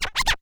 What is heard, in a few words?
Cuts, Vinyl Record Samples